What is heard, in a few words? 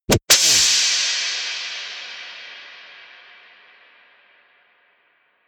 whipping; bewegung; comedy-cartoon; soundpool